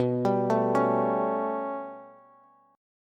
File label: B 7th Suspended 2nd Sharp 5th, down, Bass, Bass Standard; B7sus2#5 arpeggiated; chord shape; JGuitar